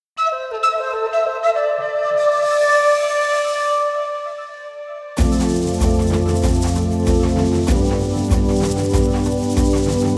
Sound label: Steeldrums Mix